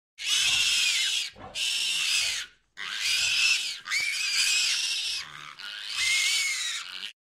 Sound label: Baby Pigs Squeal